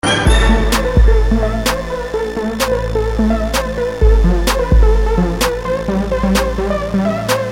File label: Techno; Trax